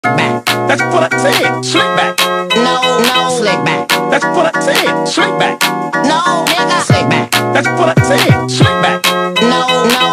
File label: Phonk